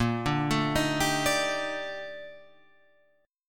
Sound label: A sharp Major 13th A sharp Guitar Open F; A#M13; arpeggiated; JGuitar